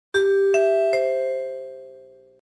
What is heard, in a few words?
nbc chimes fast